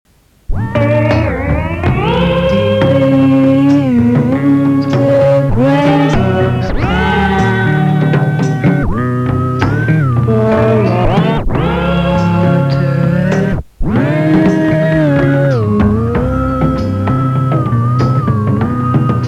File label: zachtkind graze of sand